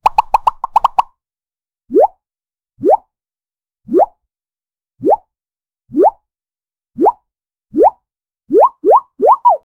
cartoon style jump in air

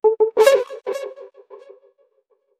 bouncy and joyful sound

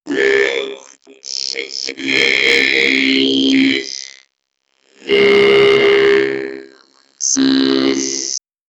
sound effect for opening, artificial intelligence

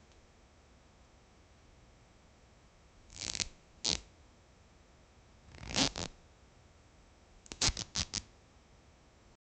indoor

